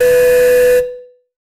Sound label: forklift mini beep